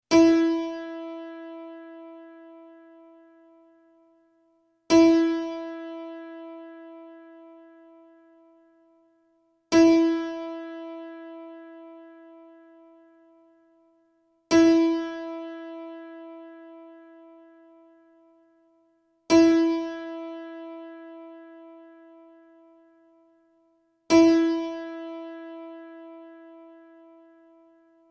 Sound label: Tuning E Piano Sound